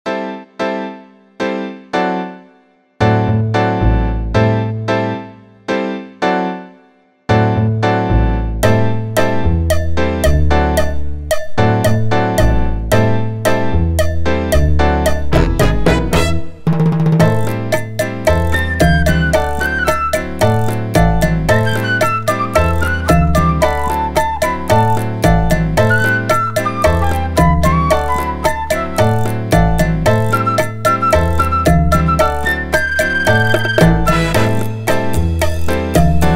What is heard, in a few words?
Latin Cha Cha Cha